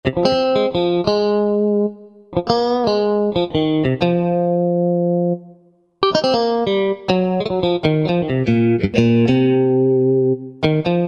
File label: Solo Strat, Roland JV-2080